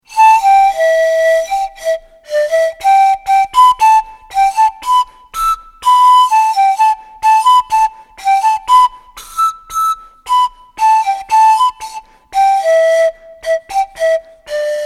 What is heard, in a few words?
Panpipes